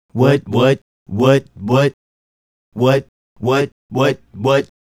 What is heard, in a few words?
Male Group, Vocal